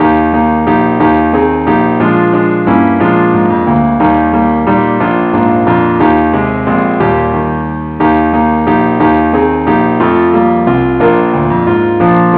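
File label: keyboard only, key E flat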